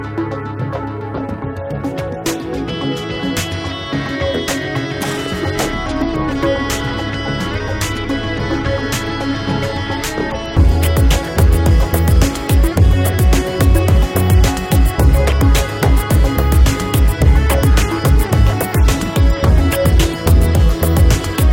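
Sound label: instrumental; supernatural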